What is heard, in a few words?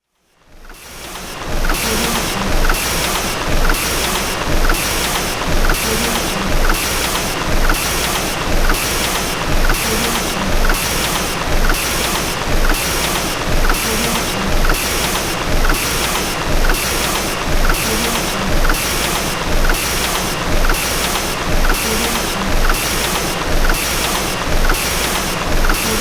car factory assembly line